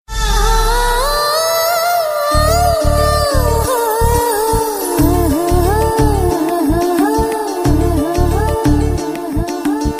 Sad Music

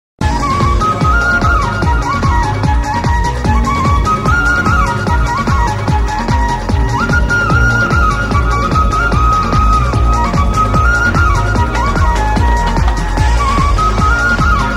Indian Fluet

